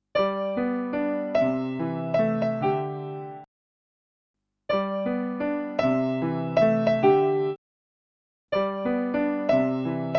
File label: 10 repetitions of grace note 2 with increasing local tempo